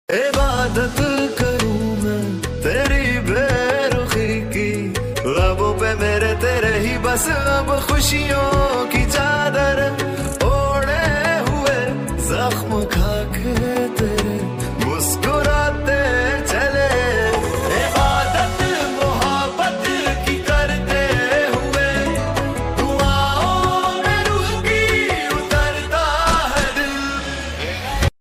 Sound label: pakistani ringtone